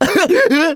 Emote Laugh; Target Hungryfrogs

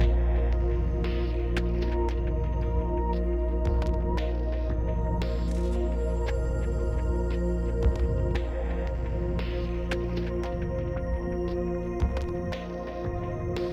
Exploration 57 5BPM

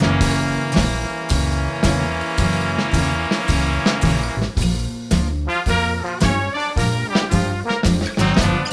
Tutorial Loop; the princess and the frog

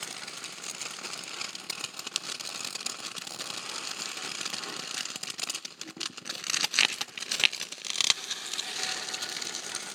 ice slide loop

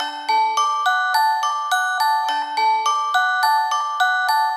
Zombie - Box Loop; Zombie Stalingrad

